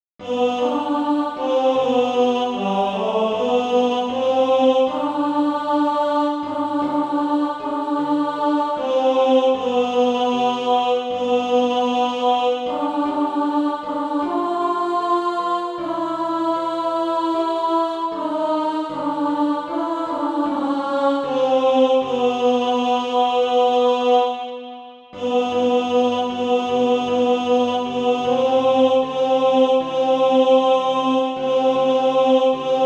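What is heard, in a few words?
alto, Practise Music; SATB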